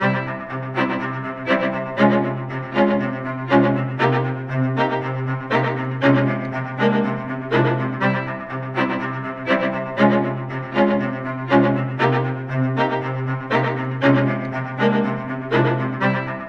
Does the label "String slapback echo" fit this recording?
Yes